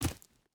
footstep burlap gravel jump land; Rust

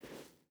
footstep barefoot sand walk; Rust

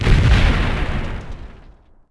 Explosion; Civ4 warlords

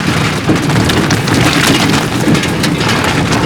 surface tire rocky rattle loop, vehicles; Call of Duty Modern Warfare

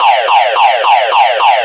car alarm loop, vehicles; Call of Duty Modern Warfare

